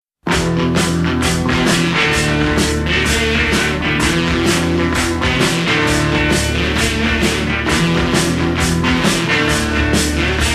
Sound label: airbus